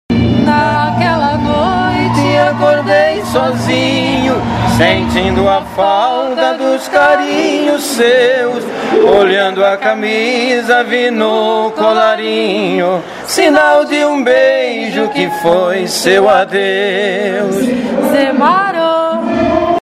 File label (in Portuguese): vinheta cantada